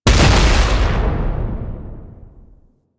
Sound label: Underground Attack Impact; Enemies; Abilities; Warframe; Proto Infested; Babau